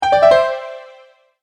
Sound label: multimedia tone alert; Tones (Sound Effects:Sound Design); McKinney Sound